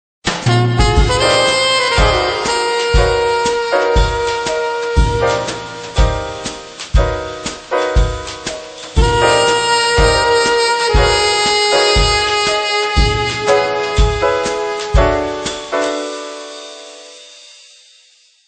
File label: saxophone jazz ring